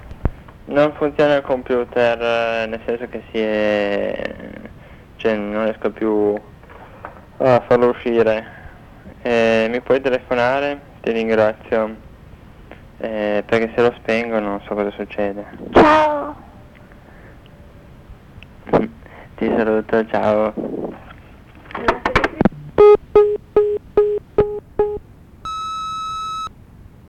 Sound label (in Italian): beat non funziona il computer